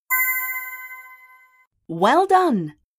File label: Correct